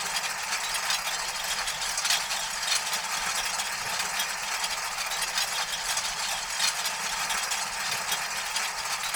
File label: L-39 AI25 ratchets, aircraft; DCS